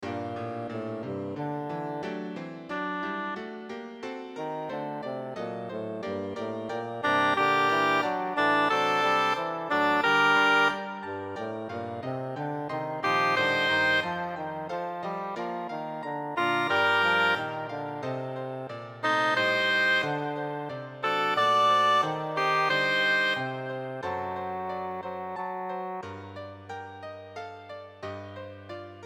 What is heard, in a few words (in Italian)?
aria con coro alt; bach johann sebastian; johannes passion; eilt ihr